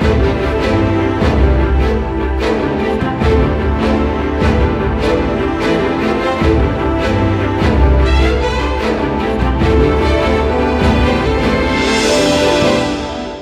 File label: Heroic Ballad